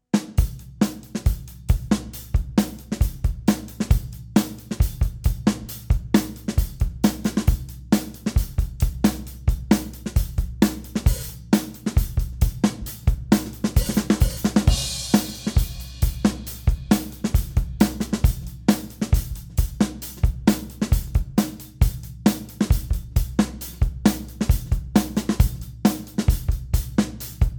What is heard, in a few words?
Lone Gazelle Drum Sample